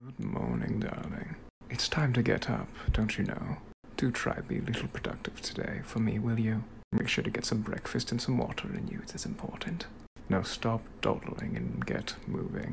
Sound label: good morning ringtone